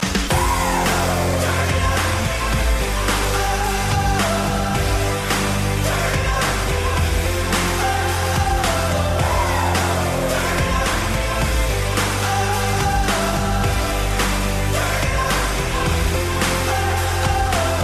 Dream Pop

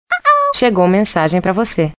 Message Incoming

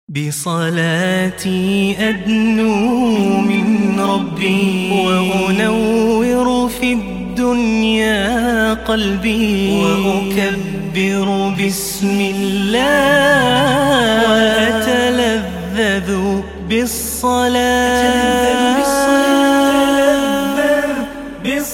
Islamic Song

